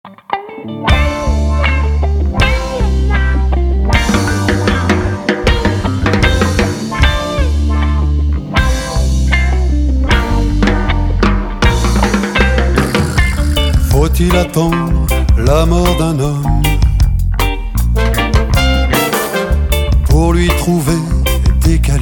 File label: Reggae